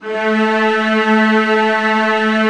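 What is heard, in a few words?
VIOLAS B3, Strings; ILIO; Synclavier Strings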